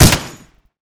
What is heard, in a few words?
Gun Skin, Damage